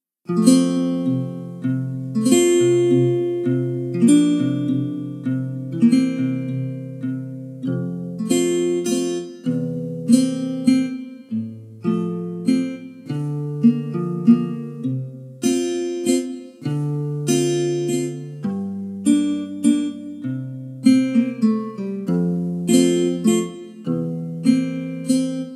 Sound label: accompaniment